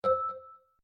sparrow mail

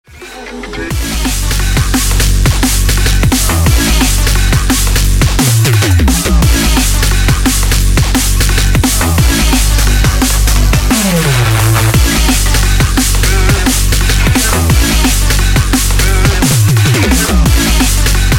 dnb

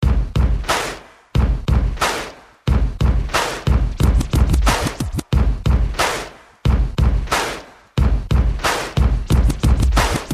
drum beats claps

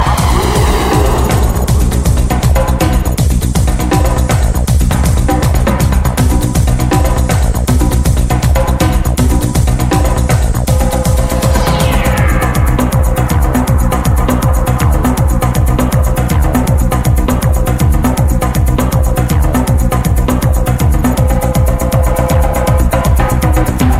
conga

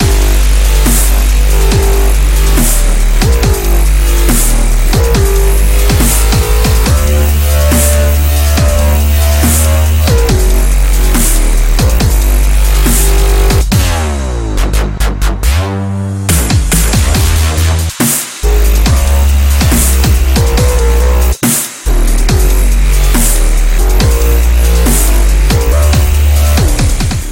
dubstep; star wars

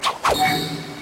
jutsu message

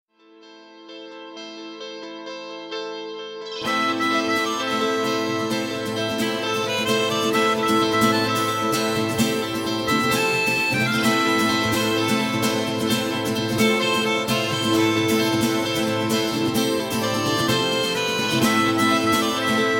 harmonica

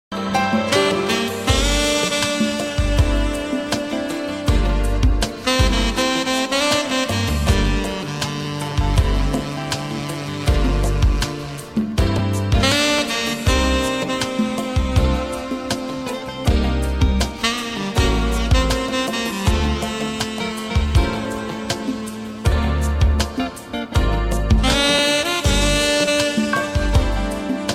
sweating saxophone

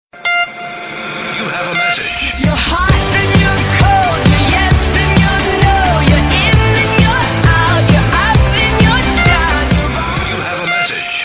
hot sms

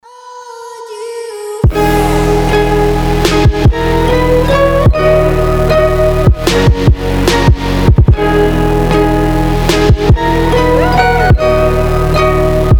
magic music

